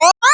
air bubble; sonic

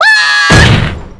scream

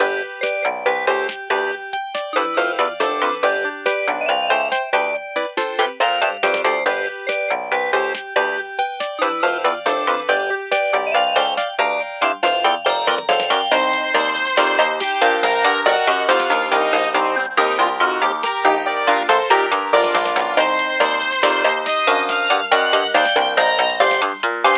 easygoingness ringtone

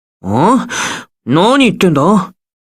confused

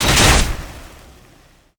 polearm attack; tressa

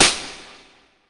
hair slap; bonnie and karin

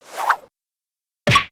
cane hit; bonny

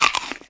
eat; tinpillar; nemmy

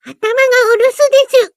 crying; usami

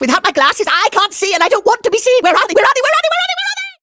breakdown

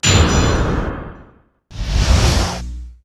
witness testimony; trilogy

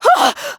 surprise; nagisa

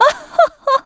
ohoho; virgilia

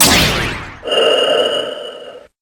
turn on

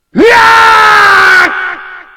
spin; ky kiske

